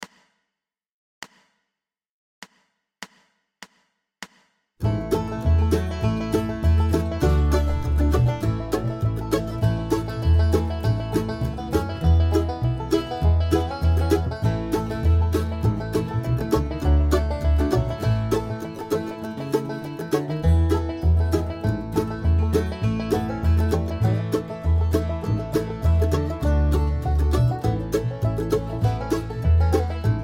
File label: 100BPM, flatpicking